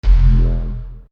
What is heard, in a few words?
Small Bomb